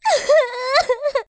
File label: Crying